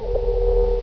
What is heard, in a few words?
physgun loop, weapons; hl2